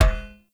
Foley Spear Grab; Enemy; Nimrod

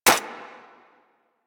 Pistol Mech Initial, weapons; Warframe; Thanotech

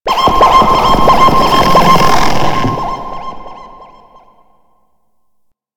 Drone Alert; Warframe; Grineer Informant Drone

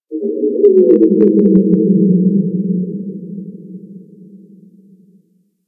Gas City Exterior Low Mid Space Texture; Ambience; Warframe; Corpus Gas City

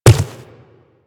Inf Quadruped Medium Walk Dirt, Footsteps; Warframe; Infested

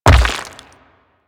Rock Elemental Footstep Default; Warframe; Brawler

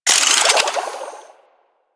Water Sprayer Alt Pump Load; Warframe

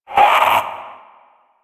Possessed Vocal Pain; Enemies; Warframe; Priest Quest; Possessed Red Veil

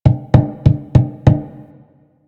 Finger Thump; Warframe; Rail Jack Hunt

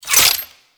Necramech Repair Drone Idle Movement; Enemies; Warframe

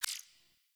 UI Default Theme Select Confirm; Warframe

